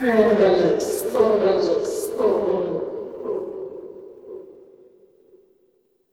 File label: crowd murmur